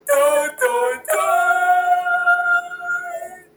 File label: the dun dun dun guys